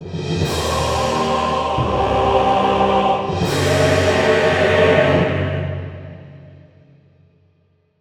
Garden Arena Fail

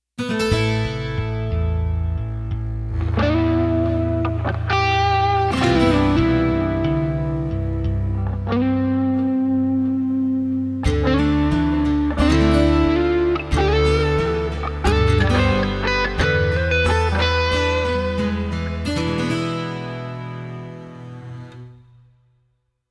Combat Theme TENSION

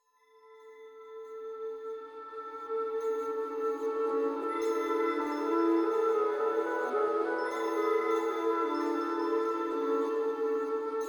Windy Chimes; Void Bastards

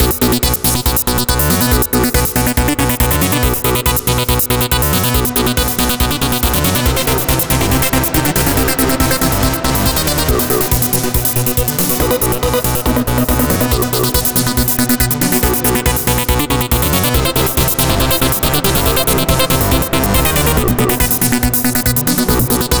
Game Loop; starfield wars

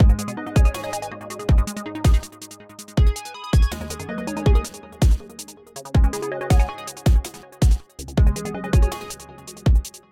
electronic menu music loop

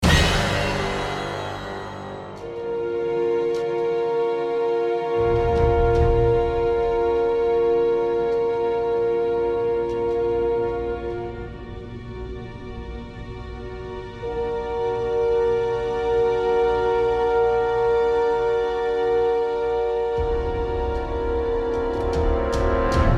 Creepy Snow Agitato

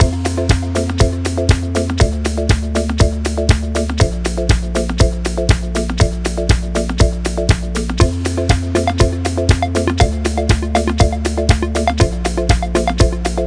Music Briefing; Smart As...; PS Vita; Music Language - Briefing4

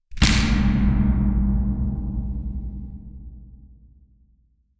City SFX, Wood Support Breaking

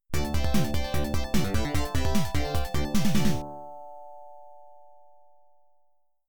Extra Ball & Big Points, pinball

